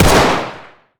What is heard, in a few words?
fire, glock; arccw ud